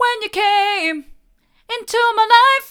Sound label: hard, vocal